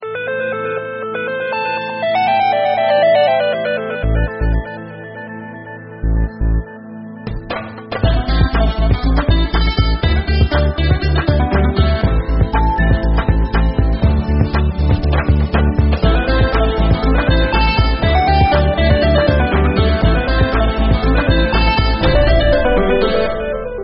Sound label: motorola ringtones